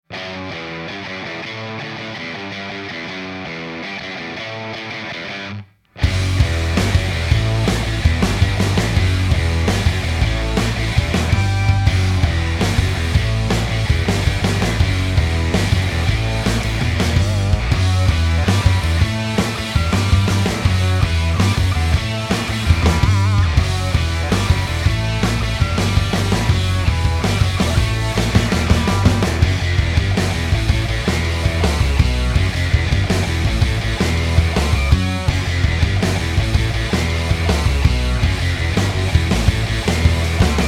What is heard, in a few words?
Instrumental; PM USA